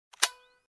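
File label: shekchok vykluchatelya, switch click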